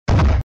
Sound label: fire; FISH